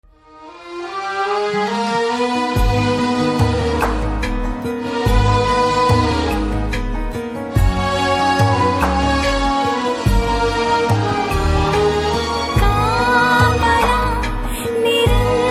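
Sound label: background music Telugu Ringtone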